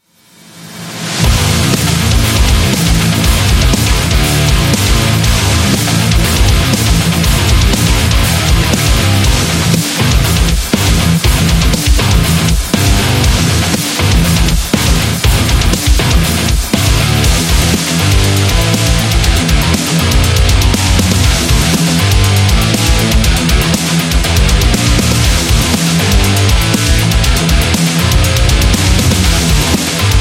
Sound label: Metal